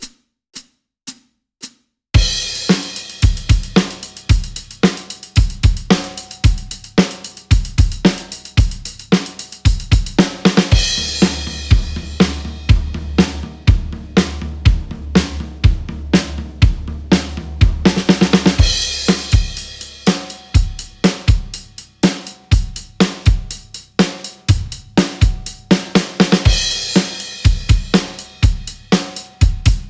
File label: variety pack rock